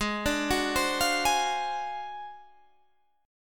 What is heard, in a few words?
D 7th Sharp 9th Flat 5th G sharp